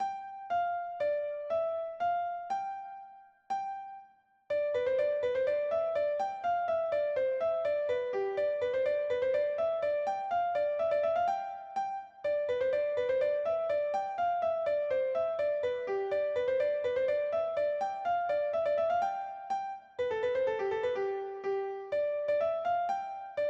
kadrilla polka; kadrille

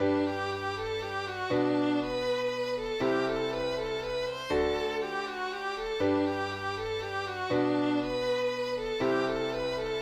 mazurka

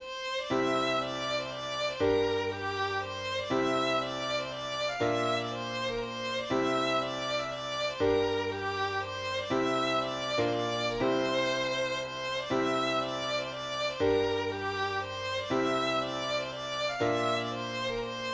mazurka

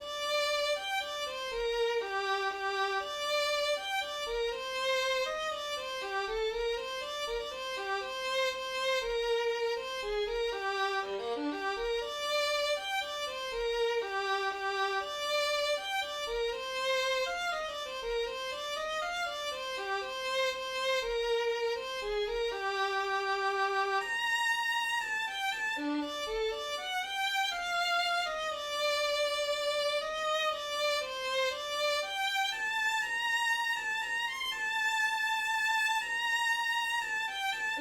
waltz

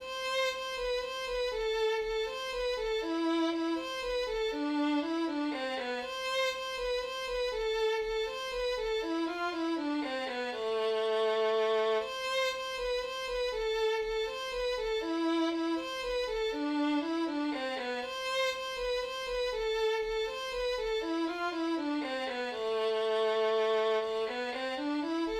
mazurka

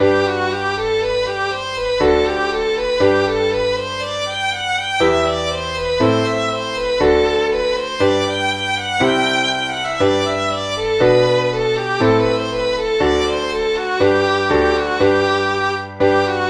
the fairies hornpipe

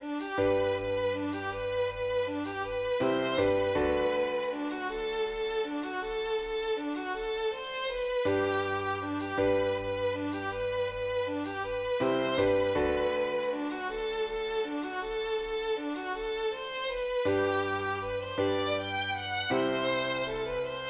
mazurka

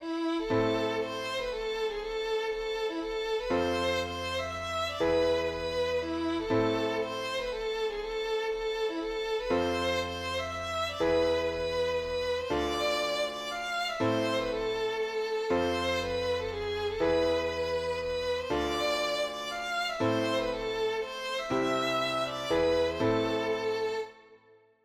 mazurka

